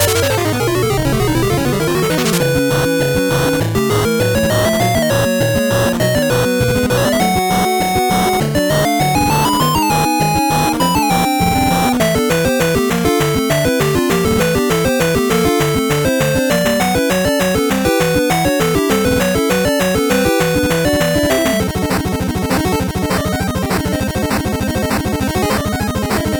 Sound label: 8bit bgm fast